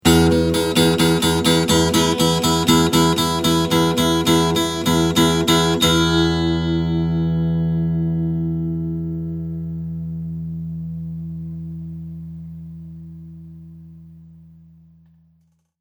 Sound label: Tuning Guitar, Tuning an E string on an acoustic guitar.; Musical